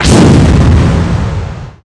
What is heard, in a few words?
Final Smash Dash; Beast Ganon dashing through opponents; Ganondorf